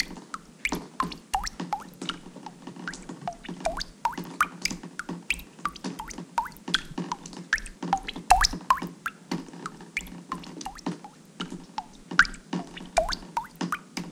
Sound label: Drips Ambience